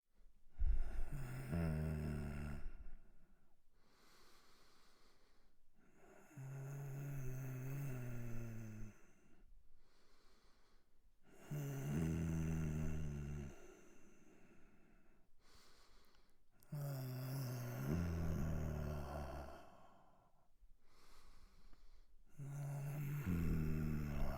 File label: male sick breathing